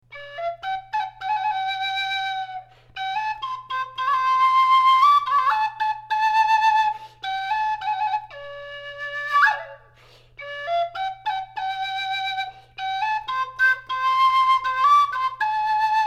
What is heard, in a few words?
Zozulya sopilka classic soprano pipe flute; handmade